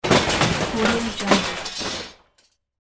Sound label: big crash